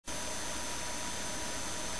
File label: windy; desktop pc